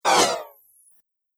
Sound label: Whoosh Swipe